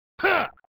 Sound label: Male Attack; MK2 ARC Unused